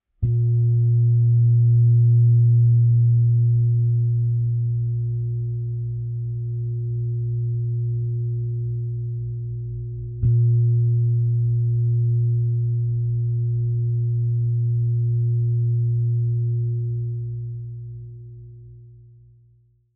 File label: Deep Cellular Healing Sound Bowl Meditation